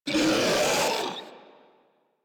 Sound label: Proto Infested Ancient Player Spotted, Enemies Vocals; Warframe